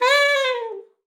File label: sax d5, Saxophone slides; Session Instruments